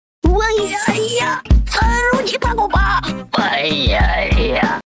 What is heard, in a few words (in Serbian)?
muzika